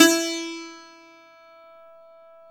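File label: Bouzouki E 2, Mediteranean Traditions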